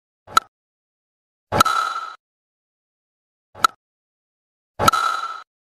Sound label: Cricket; ورزش